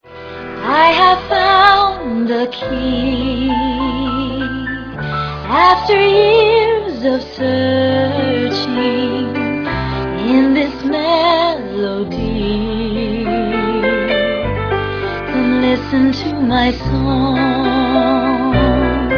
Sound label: melody